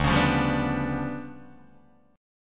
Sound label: D Minor Major 13th, down, Guitar, DADGAD